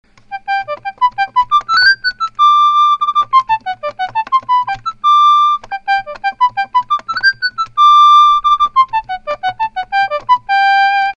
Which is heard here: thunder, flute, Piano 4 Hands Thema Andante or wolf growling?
flute